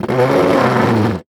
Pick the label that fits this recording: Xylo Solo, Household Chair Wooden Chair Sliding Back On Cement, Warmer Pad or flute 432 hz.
Household Chair Wooden Chair Sliding Back On Cement